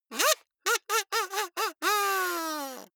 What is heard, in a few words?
kazoo laughter